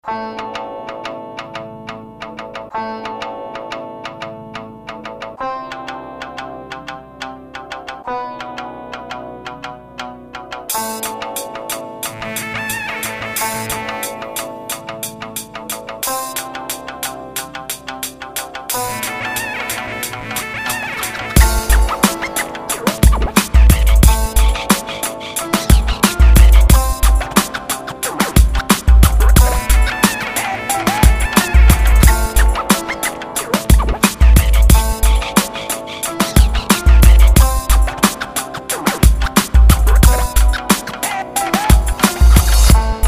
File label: beat